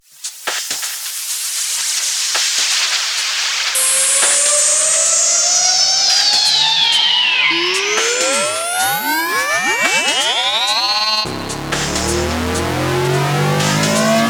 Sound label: club risers